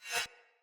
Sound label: Saw Dagger Whoosh Accent; Weapons; Player; Swords